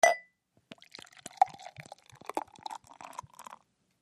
Beer Bottle Pour Clin; eating & drinking

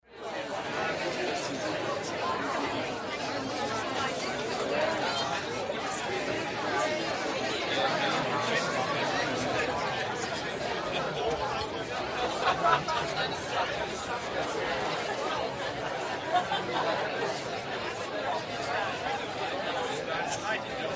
Wedding Reception, party

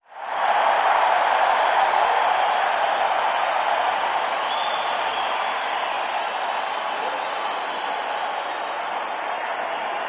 Stadium Crowd Cheers

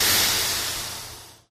Jpl 70 Space Sim Hatc, pneumatic

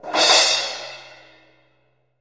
Cymbal Crash Medium

